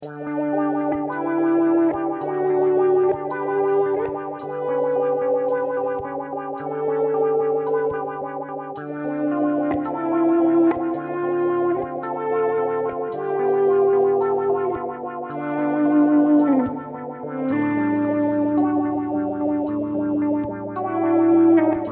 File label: Guitar, guitar trigger; analog synth; sound lab mini synth